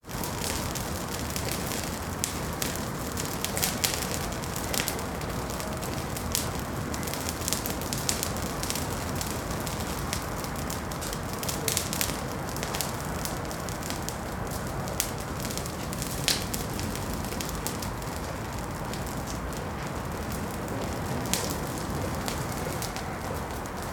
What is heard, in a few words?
Distant Camp Fire